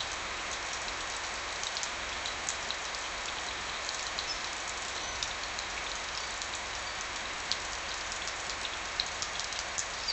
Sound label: rain with bird chipping; birds